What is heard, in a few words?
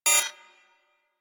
Ability Activate Error, UI, HUD; Warframe